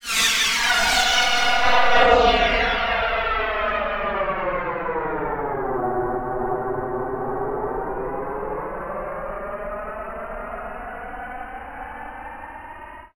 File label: Rocket, Flyers; Zero-G Seismic Frequencies